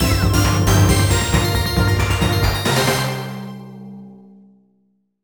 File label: Victory!; Digimon Story Lost Evolution; NDS